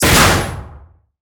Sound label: Weapons Missile Launch In; DCS